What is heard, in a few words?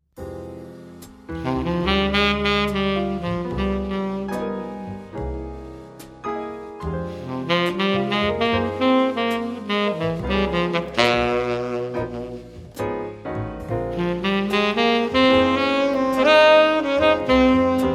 ballad